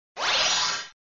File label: Sound SFX, flipper